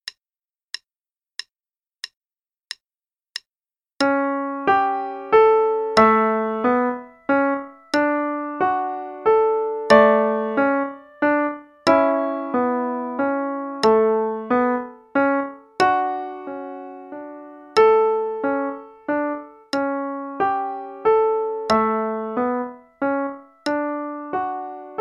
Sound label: Valsette (qn=92); Play-along; Vogel